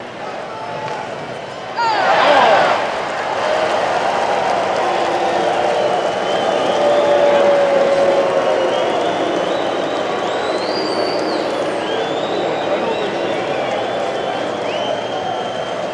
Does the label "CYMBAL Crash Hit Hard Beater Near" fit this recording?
No